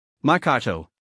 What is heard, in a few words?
marcato